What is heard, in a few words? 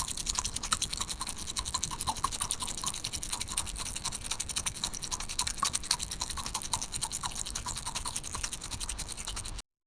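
a hand patting wet macaroni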